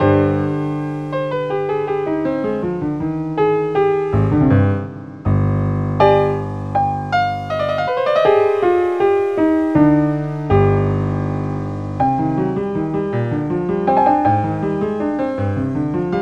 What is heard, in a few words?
PIANO